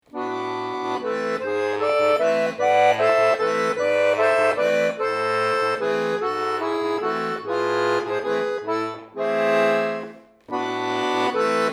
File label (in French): musette miniature valse